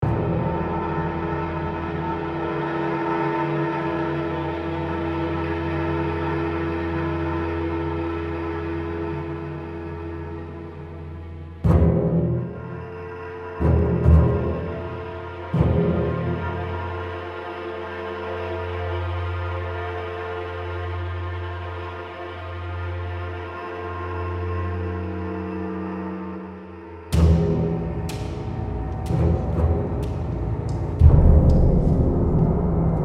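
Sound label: Music Snow Explore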